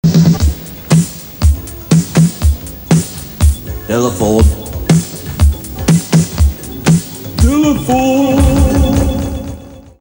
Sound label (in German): telefon jingle; fink telefon